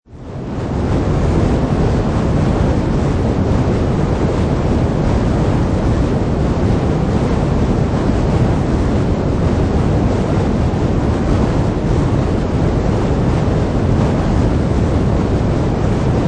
Fireball Fire Wave Long Burst, Continuous whoosh of flame moving around in the air, fire ball mov; Can also be a strong flame burning in wind.